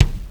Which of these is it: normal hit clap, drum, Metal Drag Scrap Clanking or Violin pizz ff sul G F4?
drum